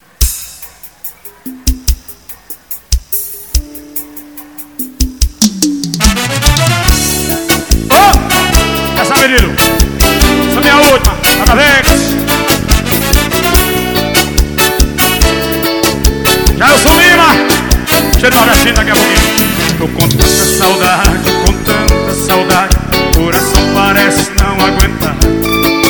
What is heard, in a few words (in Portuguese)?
sertanejo ao vivo; atletica de itabaiana; junior gravacoes